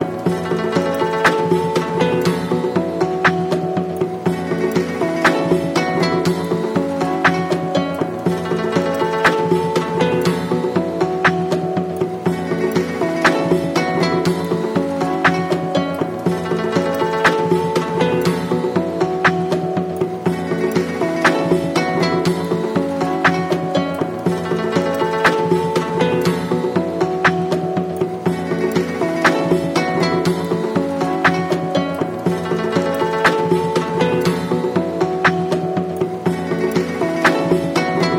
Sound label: free trap beat